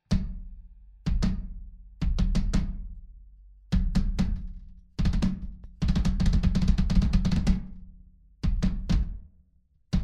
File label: BDR 22˝ x 18˝, drumsundpercussion; Fame Crystalicca